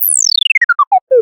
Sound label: Arcade Chirp Descend, Video Game Sounds; 1001 Sound Effects